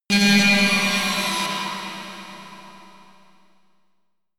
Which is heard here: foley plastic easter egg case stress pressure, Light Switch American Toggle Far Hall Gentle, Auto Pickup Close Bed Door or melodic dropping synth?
melodic dropping synth